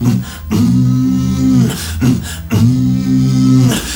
MALE HUM, M. HUMMERS

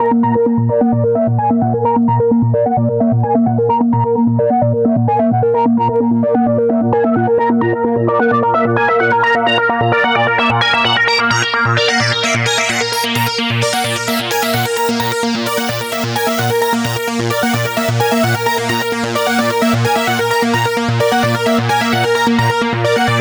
NRG Sweep, Trance

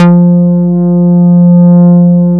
Synth Bass, Roland

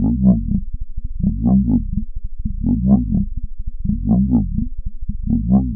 Noise, LFO & Noise

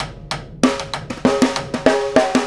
Sidestick, Drums, Snare Patterns; Essential Percussion

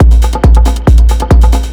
drm 138, Techno, drum-loops; Techno ID; tribal-meeting

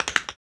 Body Perc

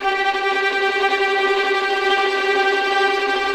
VI TREM F; AKAI Advance Orchestra Upgrade 97 Vol.1

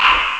noise; franetic; Amiga Soundtracker Sample Packs

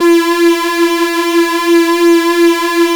3100 PWM E 3, Korg PS3100; Back In Time Records; Korg Universe VOL-1

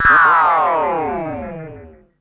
Perc Synth Moog Delay

